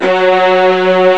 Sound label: Violas F#1